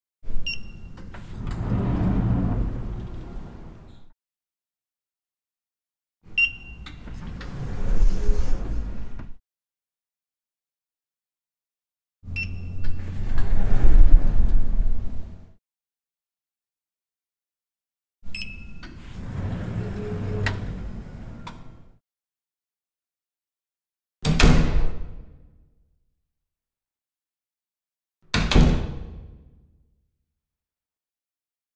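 sound of opening and closing door with electronic key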